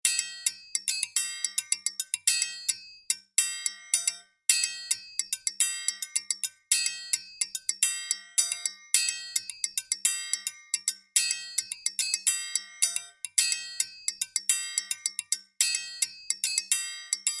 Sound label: Triangles; Versilian Studios